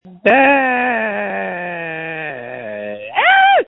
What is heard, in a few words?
screams